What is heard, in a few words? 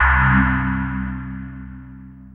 CHINGONG